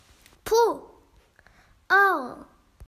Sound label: Owl